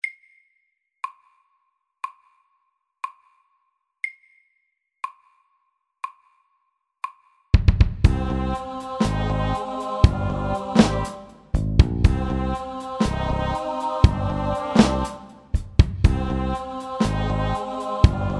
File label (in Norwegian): Octave rap SAKTE